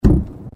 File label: Boom Fall